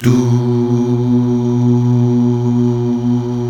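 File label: Male Duh; Best Service Gigapack 1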